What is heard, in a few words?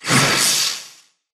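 impact bullet energy titan shield arc; Titanfall 2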